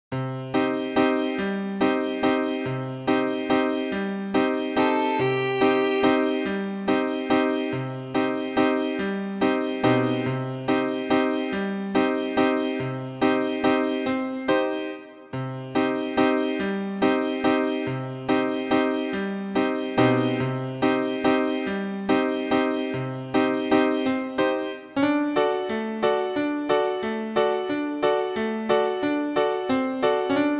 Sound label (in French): do piano